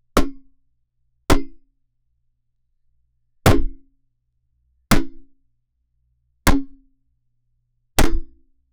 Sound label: the sound of a giant mallet striking a cartoon character